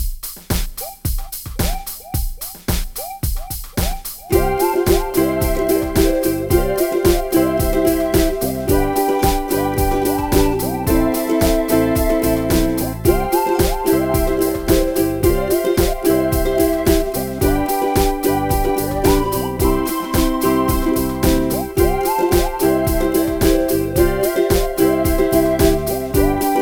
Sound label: Uke; jukebox uke